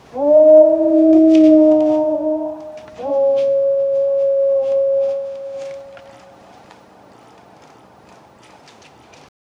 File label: haunted forest footsteps, deeper